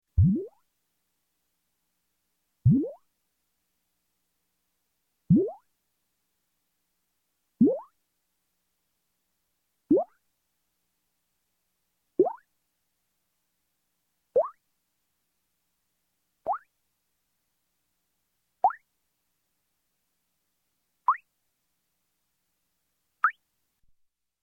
Space Bubbles, Many Different Versions, Electronic; Sound Ideas 2000 Series